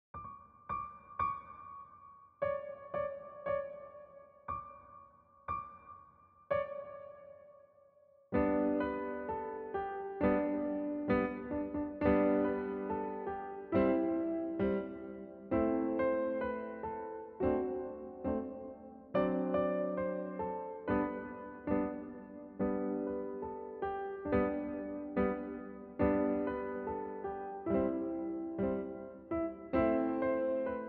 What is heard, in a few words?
Jingle Bells